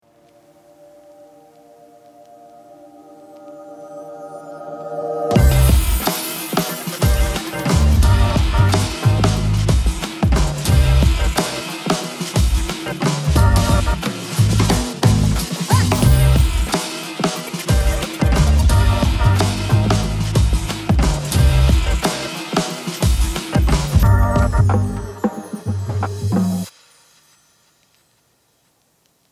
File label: Uplifting stripped